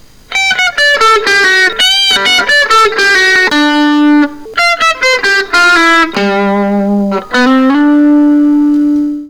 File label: cover solo